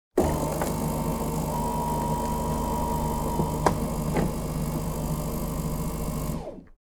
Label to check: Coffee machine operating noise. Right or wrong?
Right